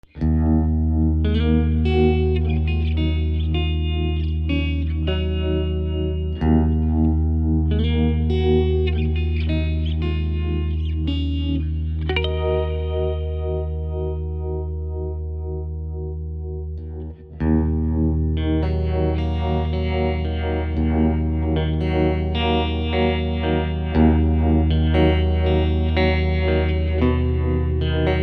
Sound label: filter trem swirl